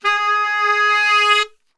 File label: G#2 SAX, Brass Super Section; Best Service